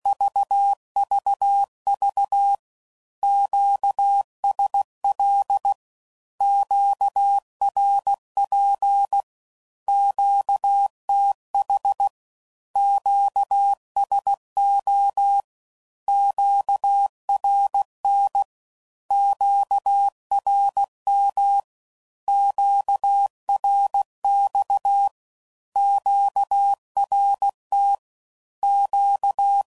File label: Q Calls Once, CW Code Groups 15WPM; Morse Code